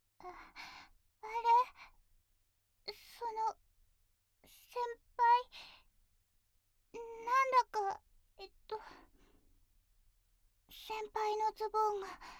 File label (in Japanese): ナデナデしながら、お耳も、舐めちゃいますね